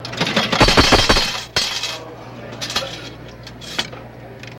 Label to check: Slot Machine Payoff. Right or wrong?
Right